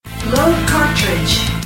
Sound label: raising heart load cartridge; nanoha